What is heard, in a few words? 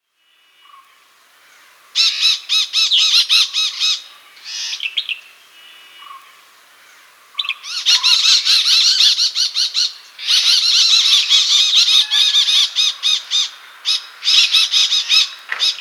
malabar parakeet